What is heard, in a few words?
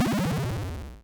Lose